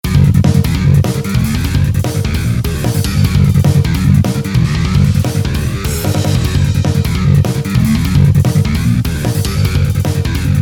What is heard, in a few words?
over bass